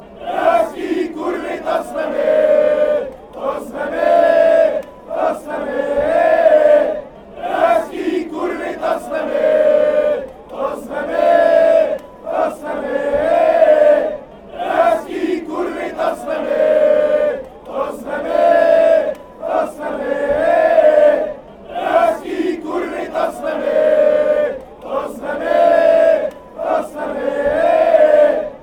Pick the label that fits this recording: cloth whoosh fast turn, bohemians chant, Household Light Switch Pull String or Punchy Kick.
bohemians chant